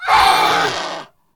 Nightscale Whelp Attack

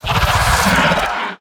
Plagued Hatchling Attack

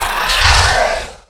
Giant Wasp Attack

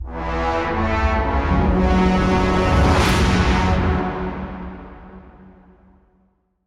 Stinger; Popgar the Putrid

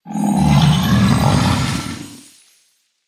Mana Treant Play

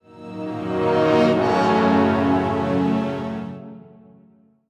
Play Stinger; Pyros

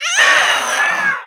Pterrordax Hatchling Death

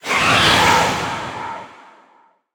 Spirit Of The Raptor, Death

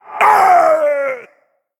Male Forsaken Death

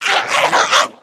Nether Imp Play

